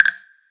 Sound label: reels start; DoubleTigersWD; wazdan